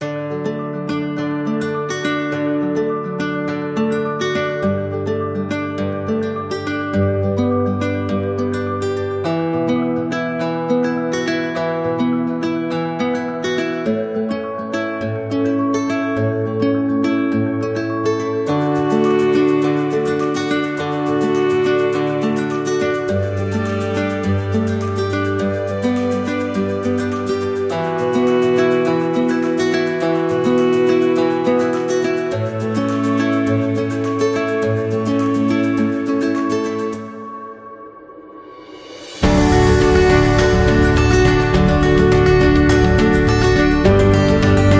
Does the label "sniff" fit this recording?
No